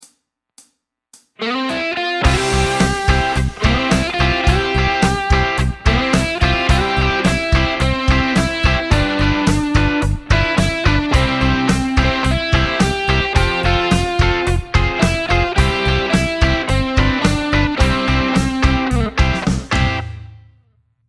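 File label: backing strumming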